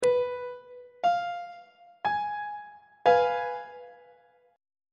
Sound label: f diminished 2nd inversion on treble clef